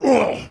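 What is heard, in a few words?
female ranger damage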